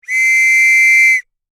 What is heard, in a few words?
Whistle Blow, Long; Sports; Miscellaneous Sport Sound Effects; SoundEffects-BlastWave